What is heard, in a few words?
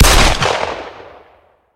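deafening shot on the battlefield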